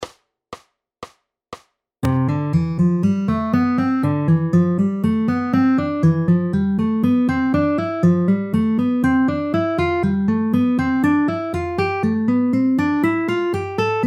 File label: Major Scale, ギター; ５弦C音から横移動してみよう; ②毎日弾くべき基礎トレーニング♯2; Mys Music School